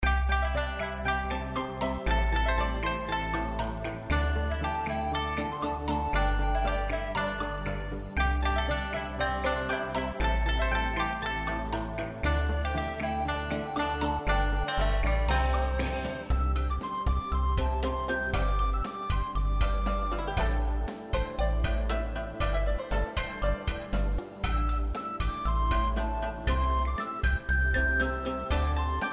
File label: backMusic